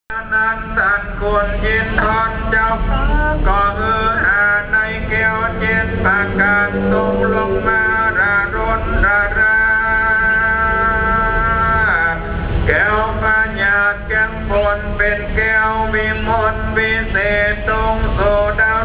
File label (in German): wat moench, Wat Litanei; thai cambodia